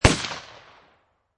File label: Pistol Rhuger 44 Magnum Single Shot Exterior At 45'; Pistol Sound Effects; Gun / Pistol: Rhuger Magnum 44, Single shot, Exterior, at 45 feet distance